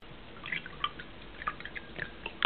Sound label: juice ringtone